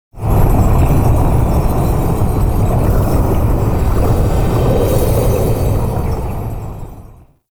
Interior Orbital Station Wind; Warframe; Tau

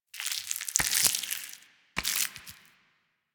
Volatile Runner Taunt Flesh; Enemies; Abilities; Warframe; Proto Infested

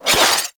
Rising Blades Whoosh; Warframe; Pax Duviricus

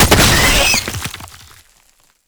Gestating Tumors Spawn; Gameplay; Warframe; Nineteeen Ninety Nine; Deep Archimedia

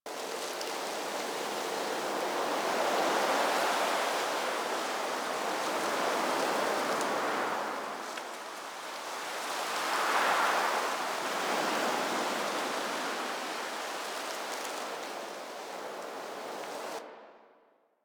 Winter Tree Wind Loop; Warframe; Ambience; Nineteen Ninety Nine

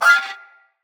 Melee Impact Metal

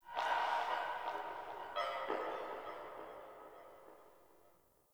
Sentient Lair Texture Interior Medium, Ambience

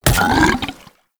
Tendrils Digest; Warframe; Infestation

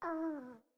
Kitten Snore; Catbrow

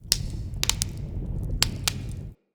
Smithy Forge Fire Crackle; Soul Plane

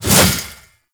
Mace Whoosh; Warframe; Duviri; Dax; Mace And Shield

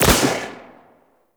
Pistol Shot; Warframe; Tenno; Tenno Bard Pistol

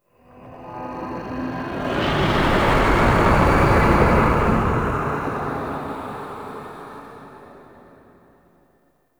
Spectral Whoosh; Warframe; Ambience; Entrati

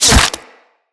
Bullet Flesh; Impacts

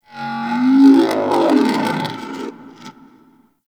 metallic creature growl; Warframe; Tau

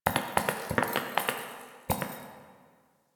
Idle Prance; Ambience; Warframe; Entrati; Tagfer

